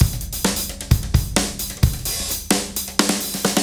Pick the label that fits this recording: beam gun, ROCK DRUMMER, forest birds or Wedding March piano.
ROCK DRUMMER